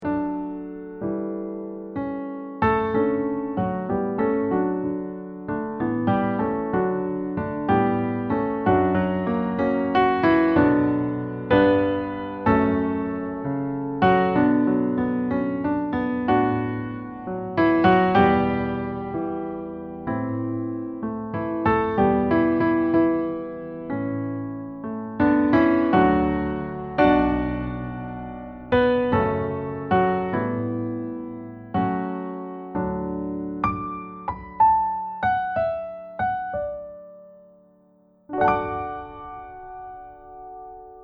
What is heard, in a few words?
accompaniment